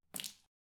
spill

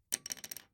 wand drop

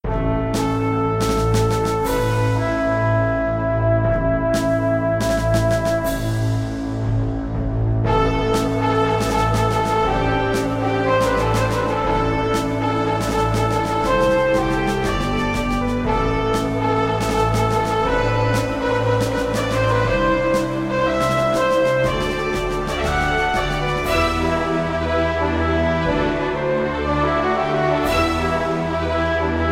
Fanfare; game music

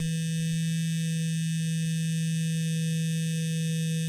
vibrator high

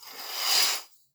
curtain open

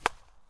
Ball Hit

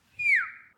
whistle

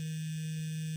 vibrator overdrive

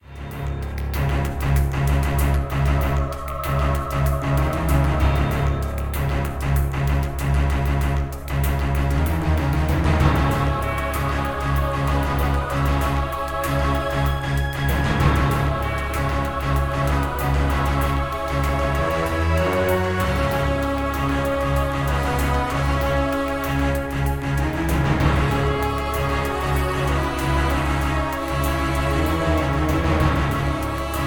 ominous music; SilverStudioGames

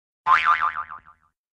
boing

